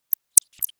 tandem chases

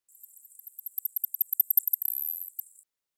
echolocation final buzz audible

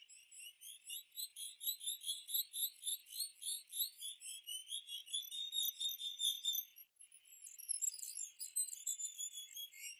babbling audible

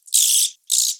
aggressive warbles audible

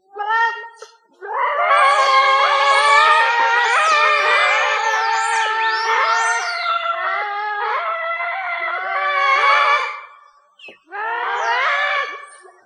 group chorus alarm; Cocha Salvador

